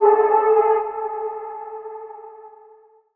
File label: vocal luna A; SD AURORA Sample Pack; Slate